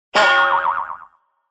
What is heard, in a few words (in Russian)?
kryshkoy